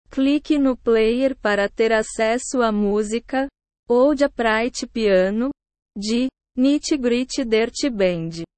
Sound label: old upright piano